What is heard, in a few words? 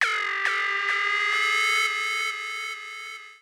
KEY SHOT TEKNO 140 A; Techno2; Singleshots